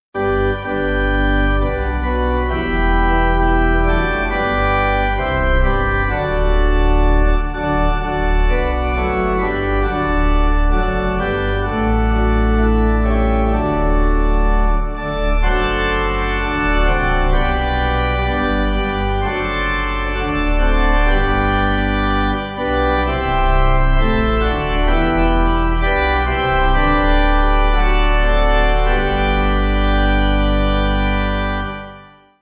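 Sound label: Pipe, Pipe Organ backing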